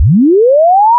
sine sweep linear

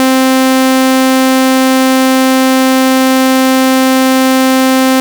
saw wave inverted

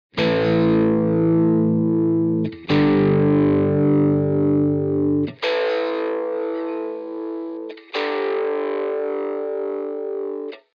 high pass filter; orinj

